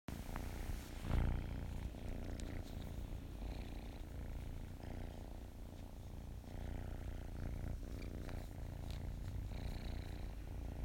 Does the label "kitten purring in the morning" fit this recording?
Yes